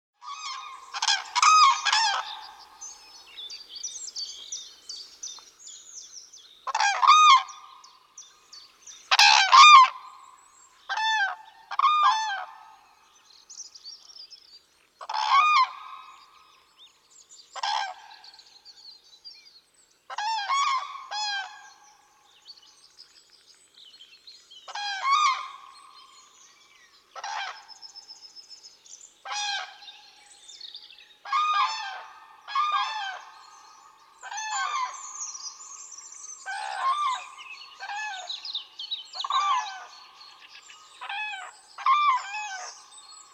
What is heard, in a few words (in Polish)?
zuraw